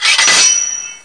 SW SWP; thief

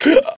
choke; player; blade

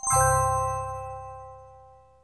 jingle default; rockrose; XChange

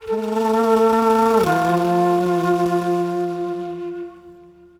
FLUTE ETHNO 125

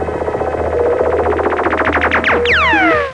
laser effect beep; Battle of the Superstars

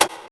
push; thief

